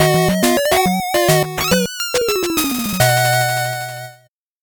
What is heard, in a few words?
8bit jingle slow